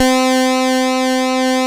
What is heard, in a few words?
TEISCO, BASSES